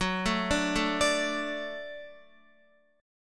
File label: Gb Minor Sharp 5th Gb Guitar; arpeggiated; JGuitar